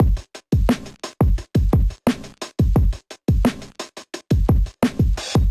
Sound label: Kit 87 bpm, Hip-Hop Drums; Golden Era; MIDI Clips; Explination; Sound Oracle